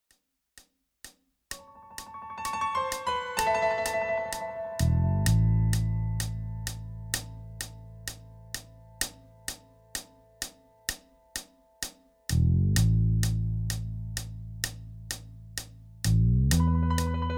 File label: Guitar Backing Track